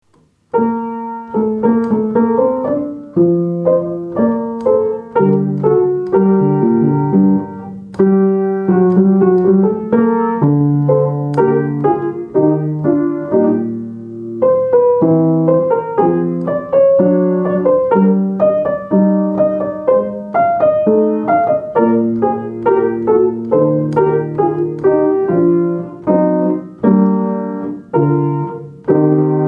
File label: verset per organo; venti versetti